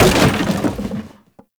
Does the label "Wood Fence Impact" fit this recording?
Yes